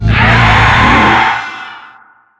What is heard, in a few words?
spider threat